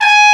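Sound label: Trumpet mf F#4; Digital Sound Factory Virtuoso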